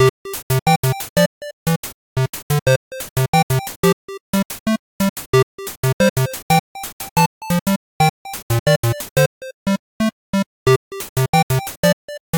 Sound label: Start Menu music